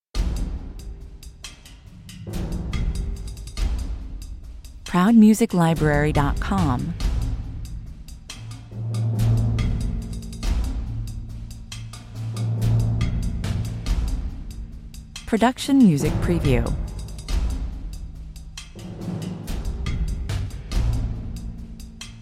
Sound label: percussion only